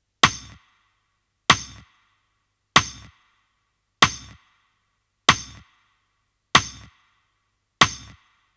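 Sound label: Snare, 95, E minor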